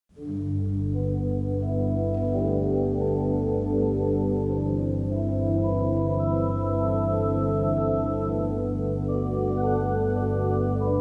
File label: with chimes